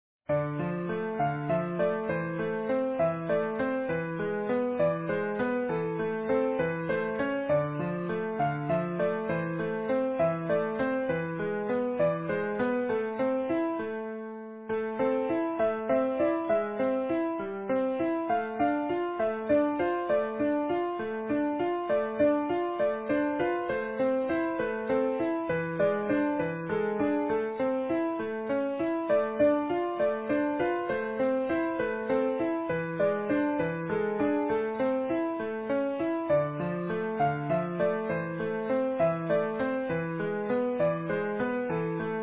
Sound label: Easy Piano